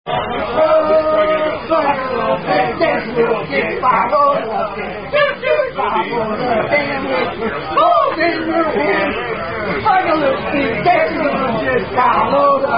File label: Follow The Band Chorus; Aka Fuck A Little Pig